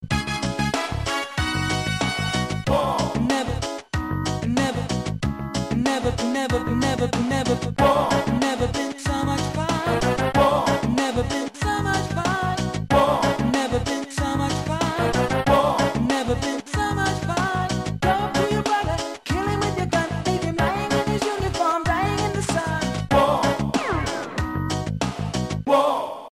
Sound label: retro